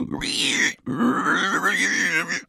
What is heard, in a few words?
Ambient pig; liquipedia